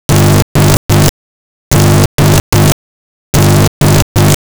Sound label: silence